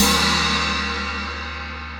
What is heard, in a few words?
16 inch filter china crash, cymbal; generation x; Meinl